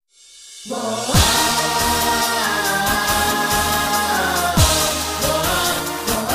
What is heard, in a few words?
laugh after pain